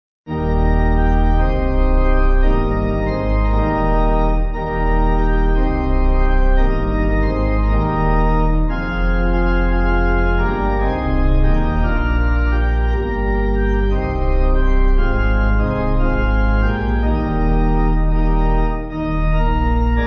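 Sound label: Pipe Organ; Resonet In Laudibus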